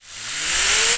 Spinner

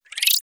Fast cog up

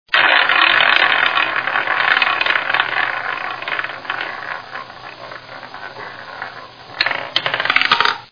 roulette wheel

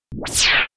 Laser whiplash

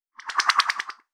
Heli wash

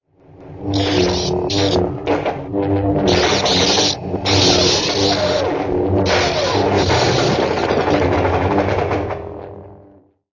lightsabres; star wars

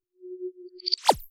L to R pulser in

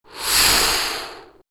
Steam long

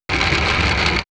Draw bridge release

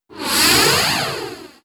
Robot breath long

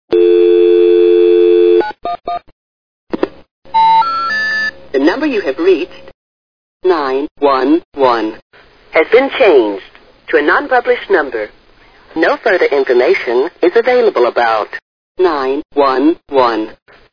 disconnect

